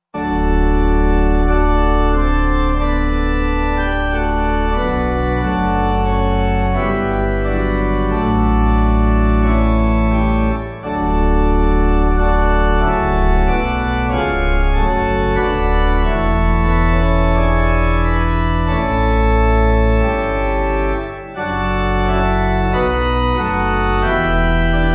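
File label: Pipe, Pipe Organ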